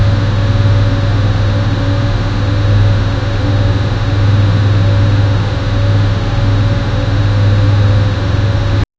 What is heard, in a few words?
air ventilation stopping, elevator